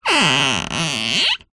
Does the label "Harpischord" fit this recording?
No